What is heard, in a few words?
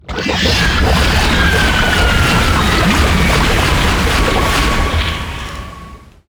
Underwater Boss Hurt; Frogger Beyond; GC PS2 Windows Xbox